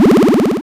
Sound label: spring; minorminer